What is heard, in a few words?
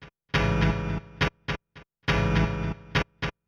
PIANO CHOR, Techno 138 BPM; Best Service ProSamples vol.54; AKAI; DAVE CEE